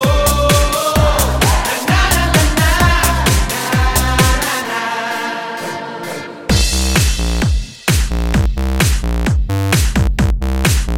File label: Remix Ringtone